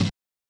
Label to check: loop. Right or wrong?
Right